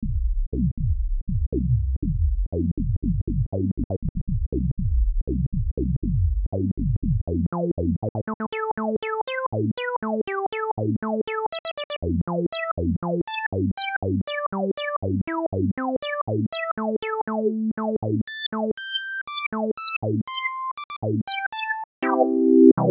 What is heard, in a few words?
terratec komplexer vsti filter demo lpf24